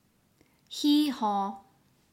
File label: hee-haw